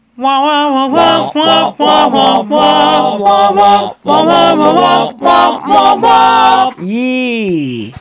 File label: All Parts, barbershop